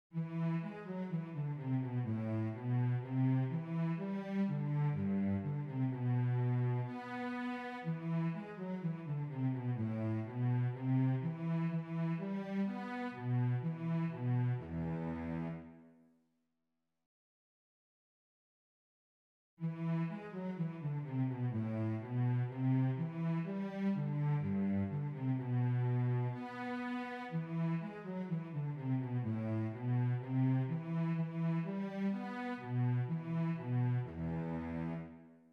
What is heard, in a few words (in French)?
VIOLONCELLE